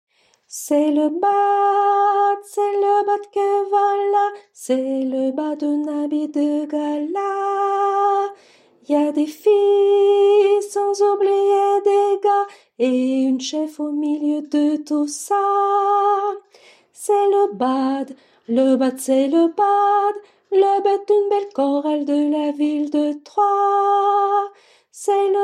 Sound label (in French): C'est L'Aubade basse